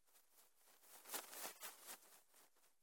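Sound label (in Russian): medium-sized butterfly flying past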